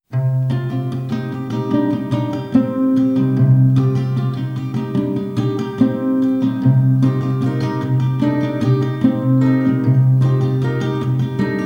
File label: Instrumental